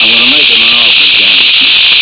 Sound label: noisy sound